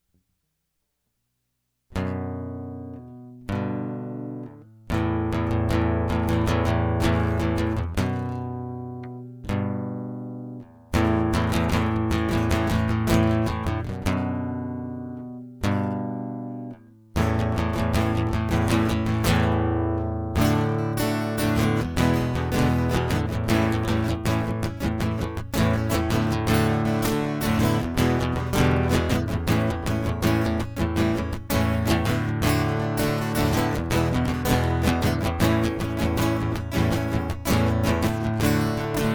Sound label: Guitar Loop Live